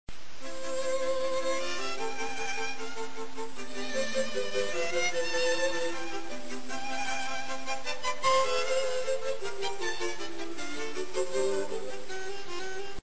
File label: allegro